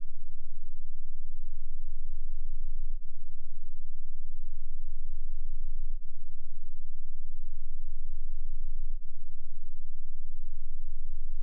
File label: Sweep; 7-14 range test